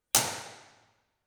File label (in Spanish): Respuestas Impulsionales (RIR) del Teatro Nacional; RIR Mono; Promedio